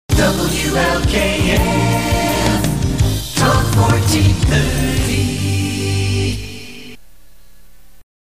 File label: jingle; WLKF; WLKF Talk 1430